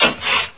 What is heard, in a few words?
paper; shogun